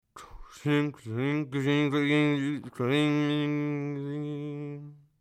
fermeture casier

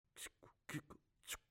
ciseaux coupe rien; Fourniture

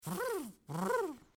fermeture élcair; Fourniture; fermetture éclaire

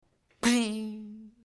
parpaing qui tape un autre parpaing; mouth-fishing

